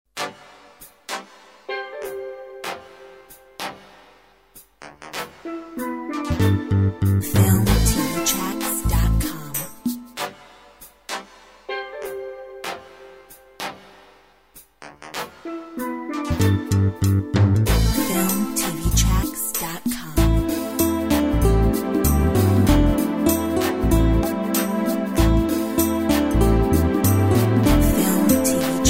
Electronic ballet; Filmtv-tracks

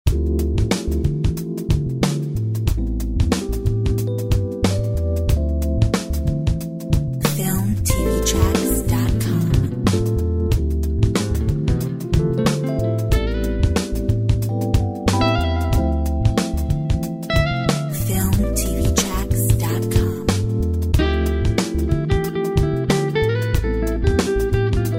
Smooth jazz night

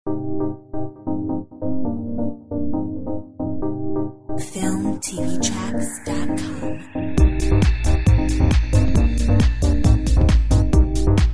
Modern techno

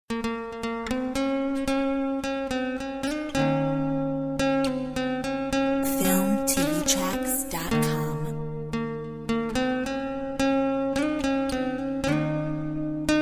Mediterranean gtr

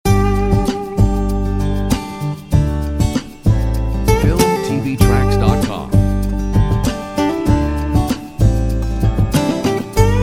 Acoustic Lounge

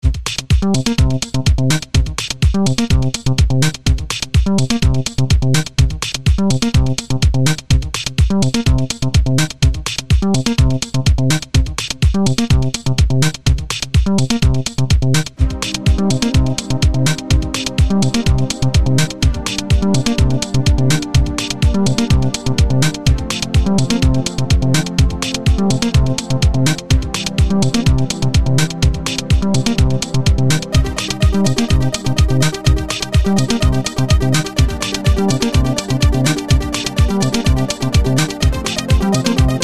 Techno

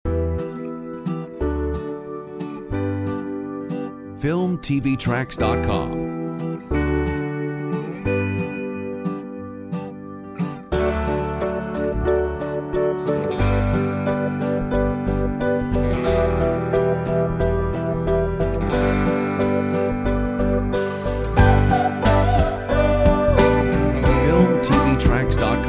drums vocal

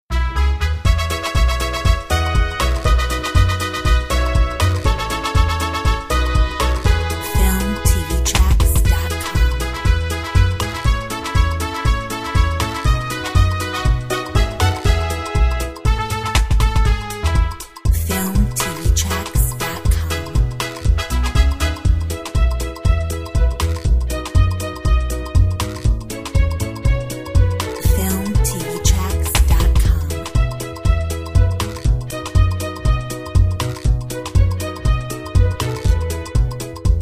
Mariachi

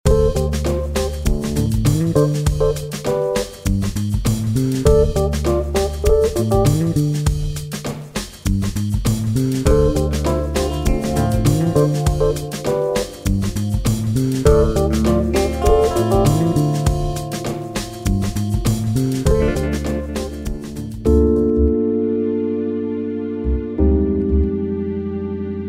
Ambiance Jazz; FILMTV-TRACKS

